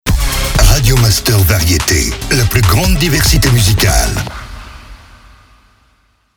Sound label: Jingle MEDIUM; Radio Master VARIETE